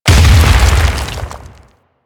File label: Barrier Collapse; Warframe; Brawler